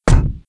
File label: Feet; Playable Tutorial Level